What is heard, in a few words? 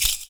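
PERC, percussions; Univers Sons - Raricussions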